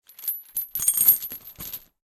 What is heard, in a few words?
chains metal dropping close; sonniss gdc